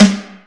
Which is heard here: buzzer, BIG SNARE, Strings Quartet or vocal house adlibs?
BIG SNARE